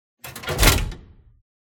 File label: lever pull